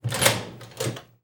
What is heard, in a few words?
Hospital Door Open; Medical; Blastwave